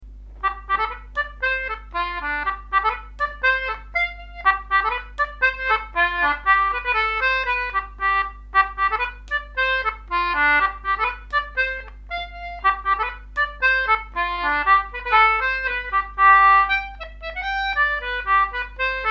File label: Concertina; Blue Bonnet